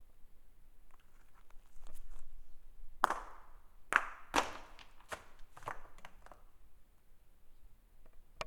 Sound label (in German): Stein In Schlucht